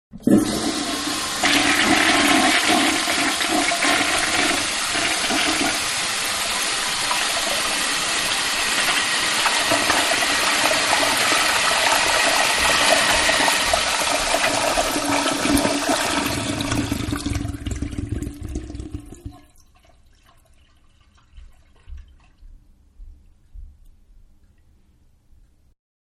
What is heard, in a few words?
Toilet Flush Far View; Bathroom; Household; Toilet-Flush 2-Far View; Manhattan Production Music